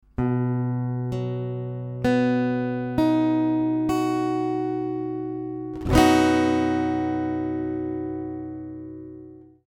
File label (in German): h dur